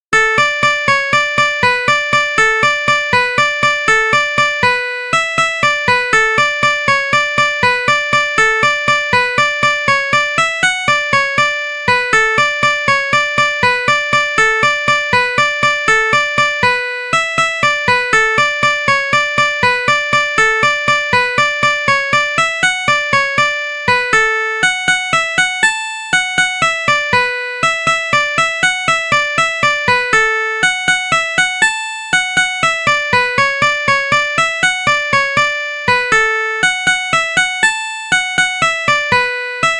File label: jig of slurs